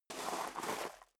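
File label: step dress boots gravel medium slide long, footsteps; schritte; menschen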